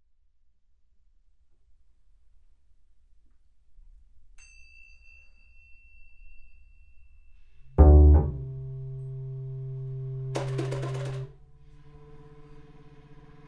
Trombone

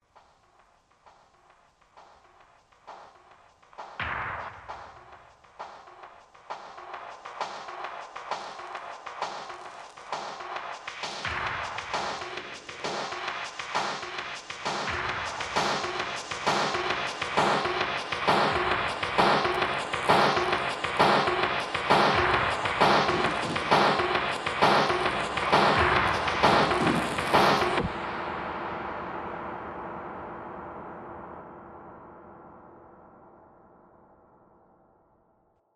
percussion

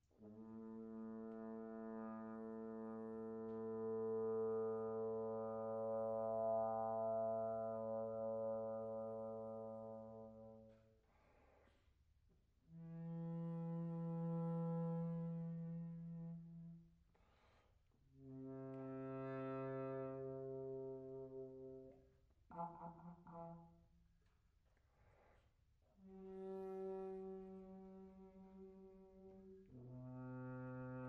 Trombone Exercise

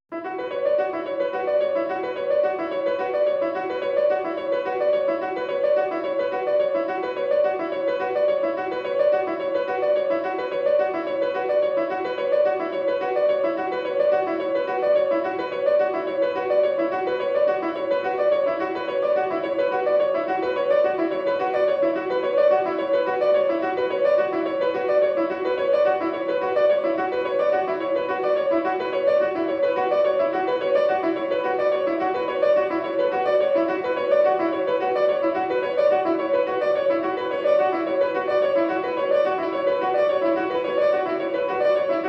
Piano Phase; early works

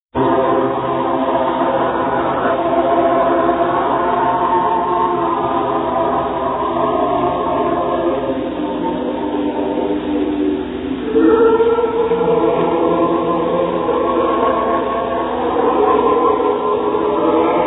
Chorus; paranormal music, raps and haunting phenomena, electric voice phenomena

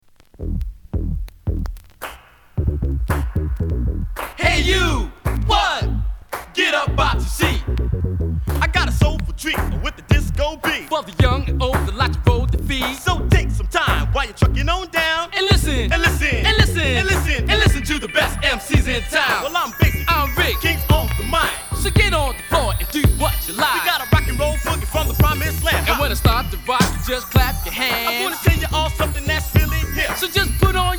Rap

